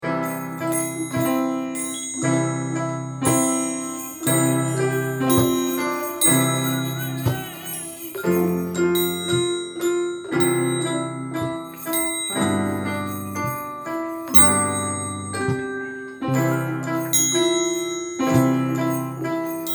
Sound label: Jingle Bells; lvr frida kahlo schule; advent 2020